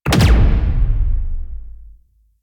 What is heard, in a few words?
Archgun Charged Punch; Warframe; Archwing